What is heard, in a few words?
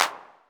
DR. LOOP, DRUMS; X-Static Goldmine 3